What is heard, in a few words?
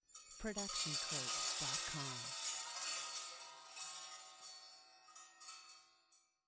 Metal Noise; soundscrate